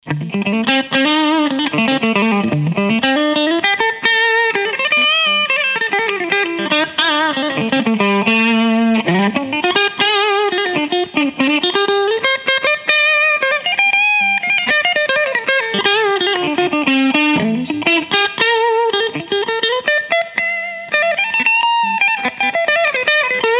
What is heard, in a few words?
br cln lead; prs22